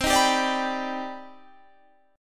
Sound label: C Minor Major 9th C